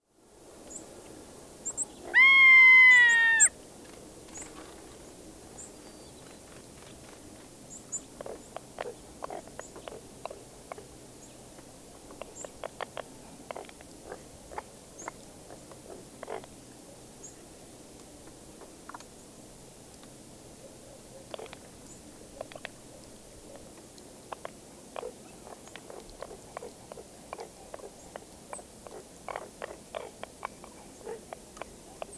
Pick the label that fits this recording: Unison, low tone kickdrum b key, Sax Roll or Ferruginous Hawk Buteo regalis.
Ferruginous Hawk Buteo regalis